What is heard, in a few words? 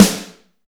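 backbeat snare, drums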